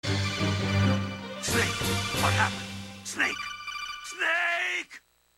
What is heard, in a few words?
game over screen; original metal gear solid